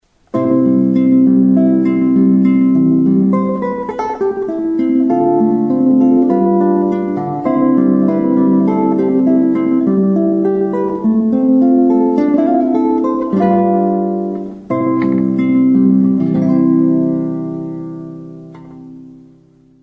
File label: classic, guitar